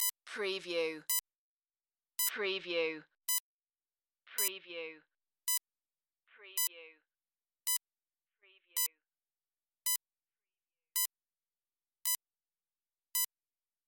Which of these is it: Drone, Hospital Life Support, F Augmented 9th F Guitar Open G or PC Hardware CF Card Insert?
Hospital Life Support